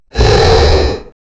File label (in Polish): mocne parsknicie z oddech